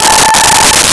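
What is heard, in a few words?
Distortion Guitar A5; Nintendo; July 2020 Gigaleak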